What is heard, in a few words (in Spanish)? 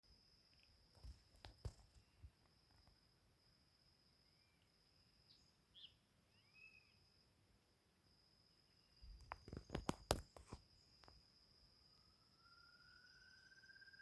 Tovaca Colorada